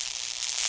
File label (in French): electrique